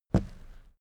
step wood step

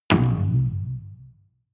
spring

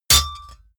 sword hit metal

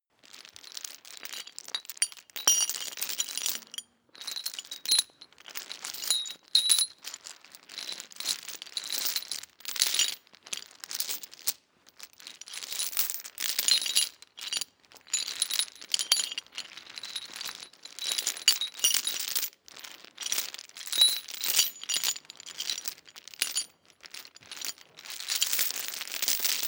metal chain movement

